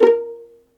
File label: VIOLIN, Synclavier Strings; ILIO